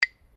Clap Sticks (Bilma) Large Single, Traditional Australian Aborigine rhythm sticks; Miscellaneous Musical